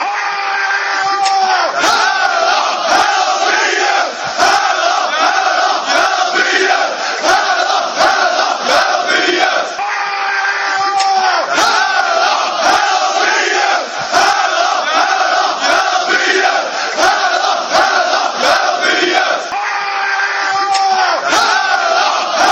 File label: fanchants; hate bif brndby if